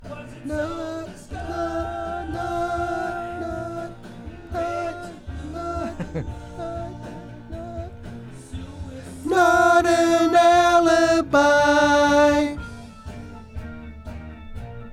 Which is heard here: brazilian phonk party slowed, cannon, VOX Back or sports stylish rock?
VOX Back